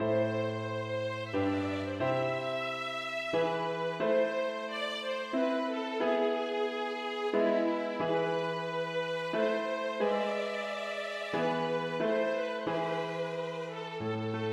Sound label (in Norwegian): Aria for to fioliner, horn og piano; Bang